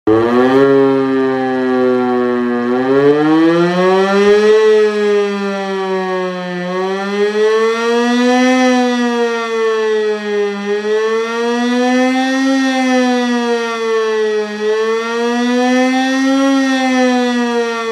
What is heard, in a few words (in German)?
Sirene Warnton E57 Schule